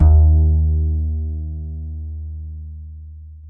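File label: acoustic bass soft D#2; Masterbits CLIMAX 7 - Bass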